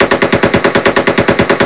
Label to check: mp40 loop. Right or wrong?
Right